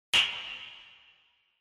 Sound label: Castanets